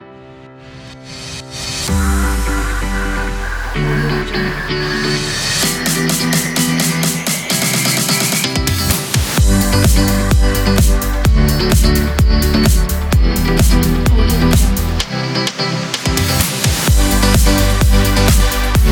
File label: a dynamic